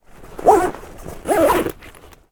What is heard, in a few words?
backpack zipping; butterflysoup2